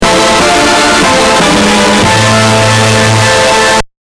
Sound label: Epic, stingers